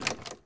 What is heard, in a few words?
Open Lock; Game rip; MiSide